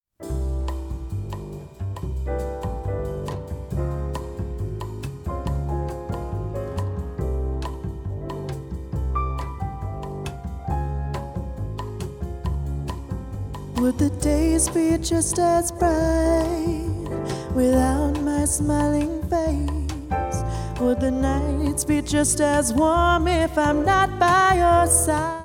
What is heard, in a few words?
Live Recording Jazz